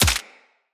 Bullet Impact Head Hit Notification; Weapons

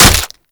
Berserker Barrage Hit Flesh C; Warframe; Warframes

